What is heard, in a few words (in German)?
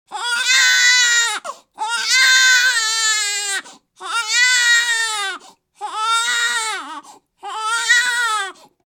Babygeschrei